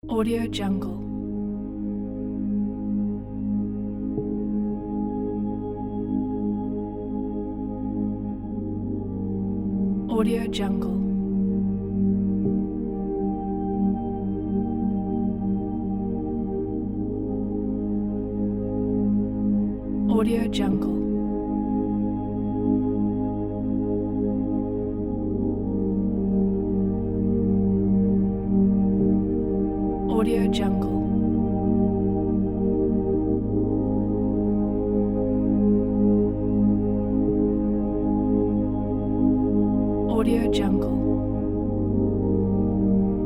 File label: soulful meditation soundscape